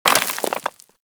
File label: Bullet Impact Debris Rock; Warframe; Weapons